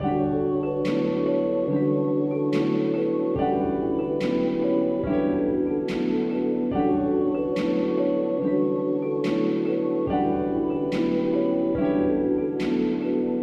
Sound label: type melody keys, 72bpm D major